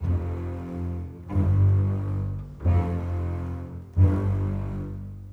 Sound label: Bass Cello, Orchestral; Sonic Foundry (Sony Creative Software); Orchestral VOL-2; Modern 25